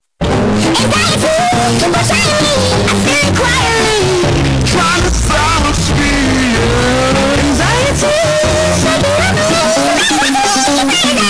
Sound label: goofy ahh sound